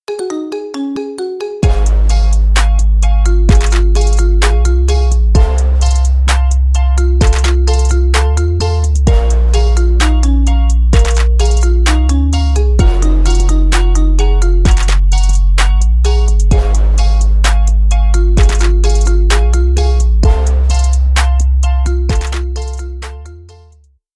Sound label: marimba remix